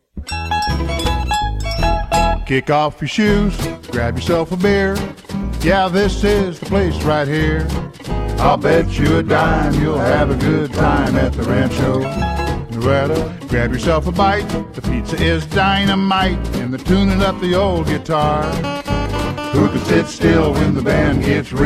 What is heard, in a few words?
Jingle; Rancho Nevada